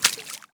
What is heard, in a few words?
run water